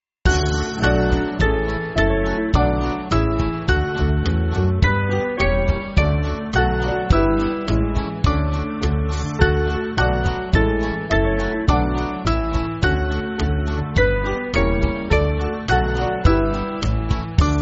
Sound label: Band, church music; Rise My Soul; Straf Mich Nicht